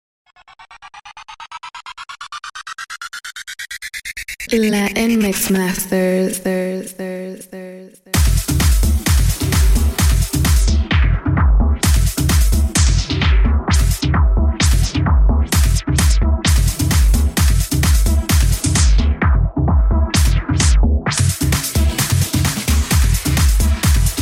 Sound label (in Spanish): Guaracha Mix